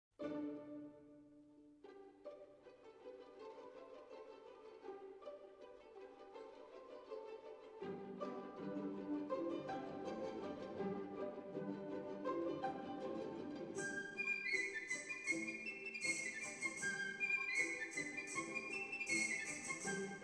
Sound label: Dance Song; lyadov